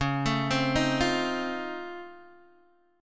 C sharp Augmented Major 9th, C sharp, down, Guitar, DADGAD